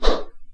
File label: throw